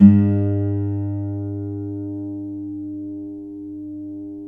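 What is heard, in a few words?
GUILD 12-string, Guitars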